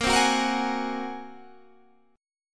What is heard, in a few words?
A sharp 7th Suspended 2nd Sharp 5th